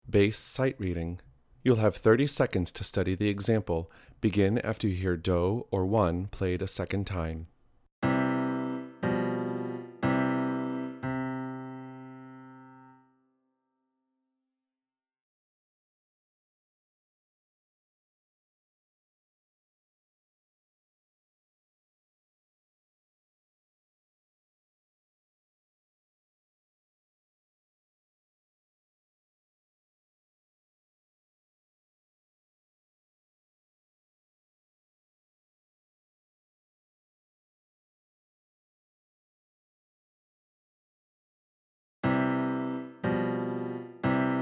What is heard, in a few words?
SR Bass in C